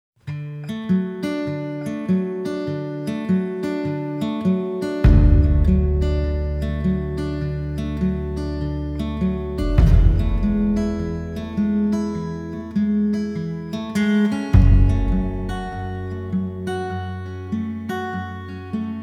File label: Instrumental